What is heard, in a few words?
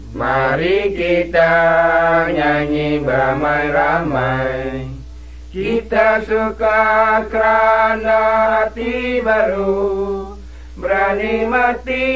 songs malay pattani